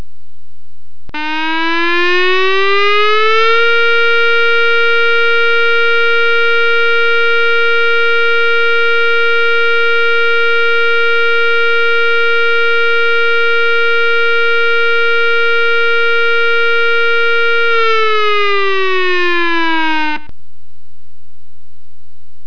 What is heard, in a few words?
Tornado Alert